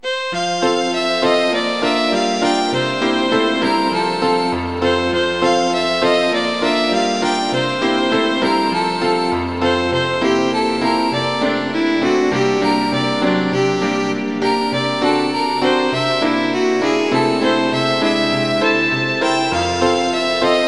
violine u klavier; kinderszenen; am kamin

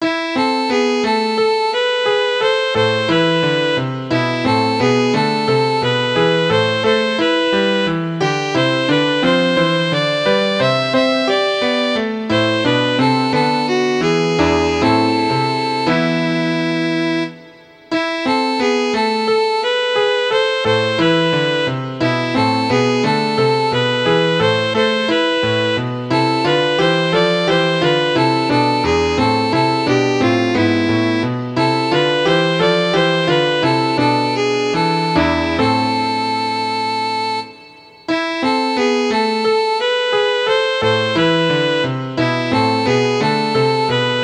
violine u klavier

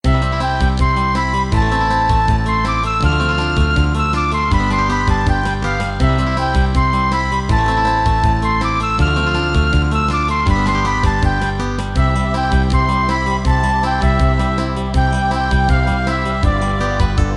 zirkuslieder aufregung im zirkuszelt playback

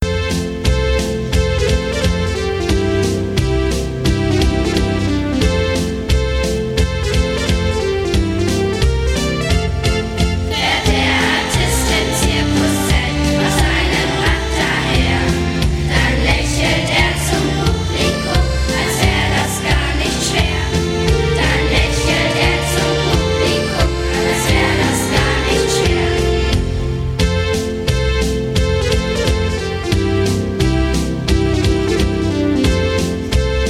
zirkuslieder

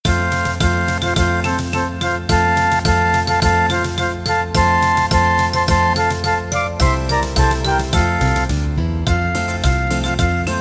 zirkuslieder playback

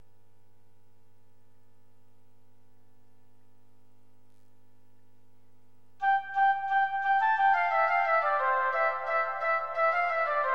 kanon fuer floete oboe und klarinette

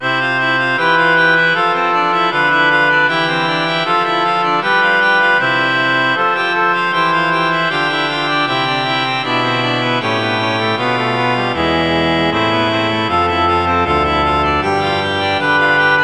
vom himmel hoch da komm ich her orgel

